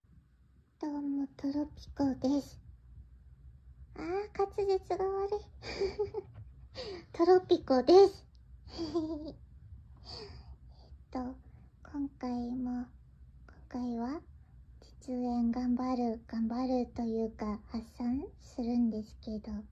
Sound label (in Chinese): 一边发出可爱萝莉音一边因为搓着阴蒂自慰而爽得拱腰高潮的声音; 【实演】阴蒂搓搓...!